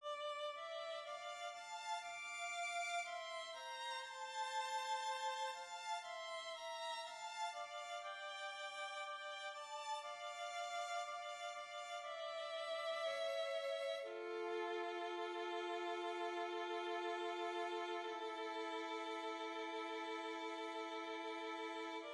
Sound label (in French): Psaume Violons